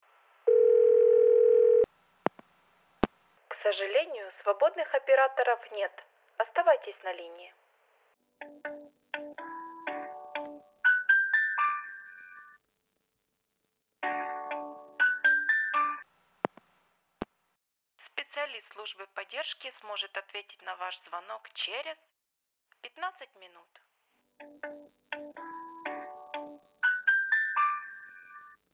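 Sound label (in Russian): zvuki ojyd otveta operatora telef, sounds of waiting for operator answer telephone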